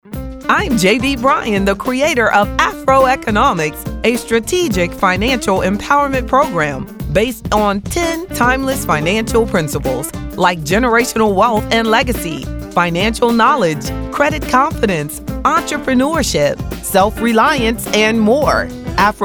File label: jazz radio; Afro-Economics